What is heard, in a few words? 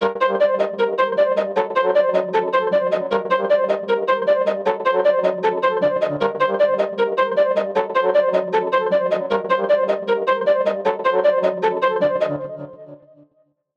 155bpm Screemer, Melody Loops; 40 Yard; TRUTH-HZ; Crowdsourced Sample Pack